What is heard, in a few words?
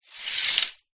household curtains net open or close